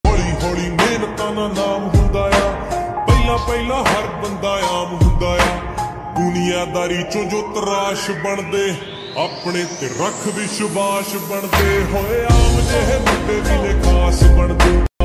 slowed x reverb ringtone

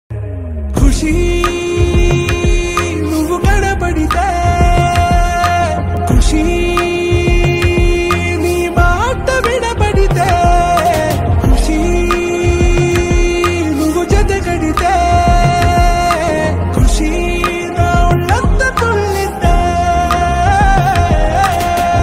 title song bgm ringtone; vijay deverakonda; samantha

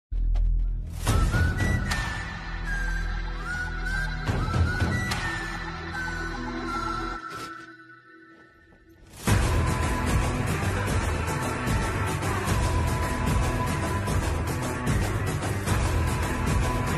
attitude bgm ringtone; rdx movie; carnival; shain nigam; peppe; veeraj madhav

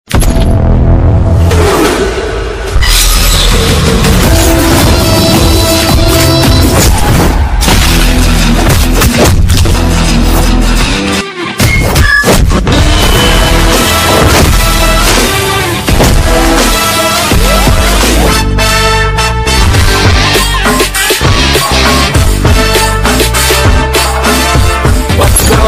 teaser bgm ringtone telugu bgm; pakka commercial

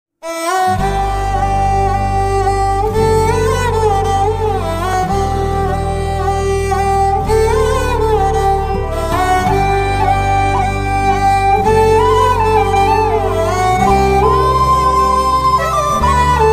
tamil love ringtone love bgm ringtone

